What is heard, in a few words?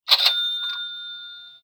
cash register